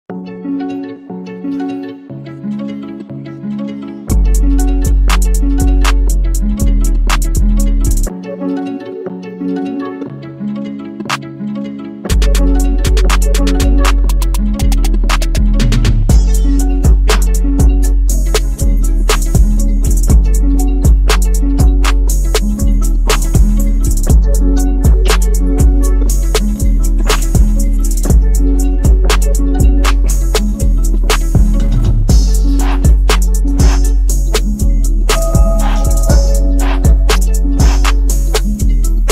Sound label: Instrumental